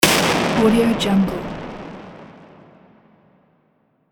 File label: hard heavy hit shot